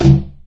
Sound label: plastic barrel impact hard